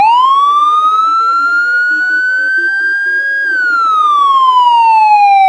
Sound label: wail, sirens; code3 3955